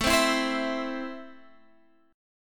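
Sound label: A Minor, Guitar Standard, chord strum; Am strummed; 17,15,14,17,17,x; JGuitar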